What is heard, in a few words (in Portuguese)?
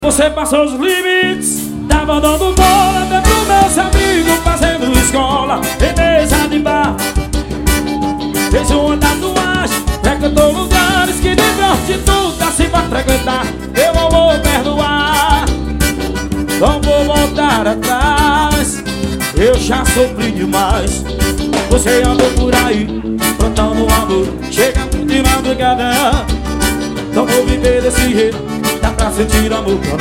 forró, Manaus; manaus am